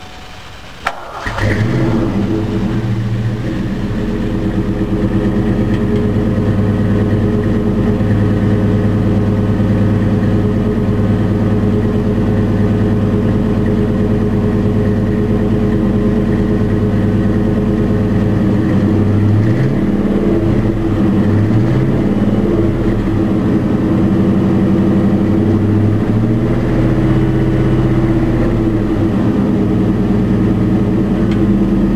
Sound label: 91 mustang lx exhaust sound; livonia mi us